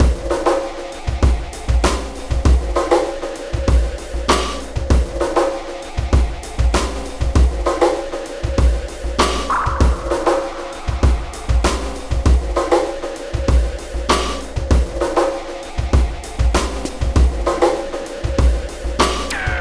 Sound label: Sky Lab Beat, Processed Drumkits